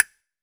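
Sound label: castanets, GM percussion kit; AKAI S6000 CD-ROM - Volume 5; GM PERCUSSION KIT 1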